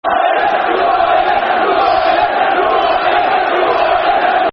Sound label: Who Are Ya, Football Chants; Middlesbrough; Middlesbrough Mfc